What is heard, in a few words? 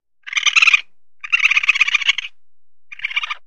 Raccoon Chitters, raccoons